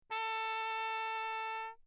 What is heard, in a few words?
TRP MUTE Lechner; Perinétventiltrompete